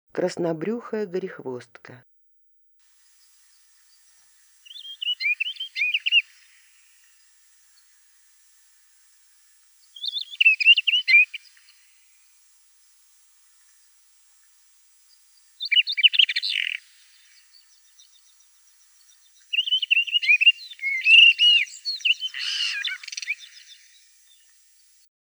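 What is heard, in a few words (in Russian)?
krasnobryuhaya gorihvostka golos, Güldenstädt's redstart voice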